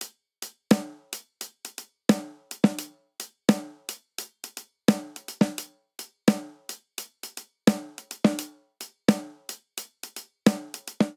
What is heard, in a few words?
Inbetween Hip Hop Snare & Hihat 86 bpm; MIDI Clips; 4 4; Ableton; Drum Booth